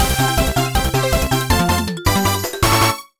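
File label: Jingle Win; minna no oekakiya san 2009 nds gamerip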